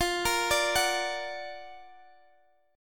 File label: Gb Augmented Major 7th F